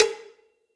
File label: Cowbell Big Ambient Loud Punch; Drums; Drum Hits; Standard Hits; Ableton; Factory Packs; Session Drums Studio